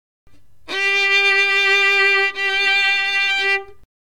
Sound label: Violin vibrato